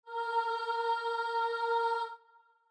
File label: starting note, voice; trionfante in bb major